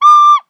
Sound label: flute